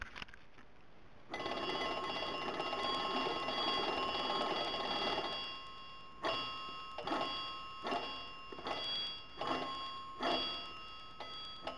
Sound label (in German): Bahn Telefon; Front links; Sounddateien mit 5.1 Lautsprecher Kanalzuweisung